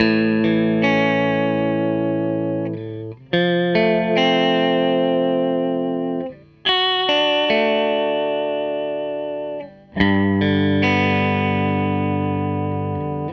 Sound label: Les Guitar Loop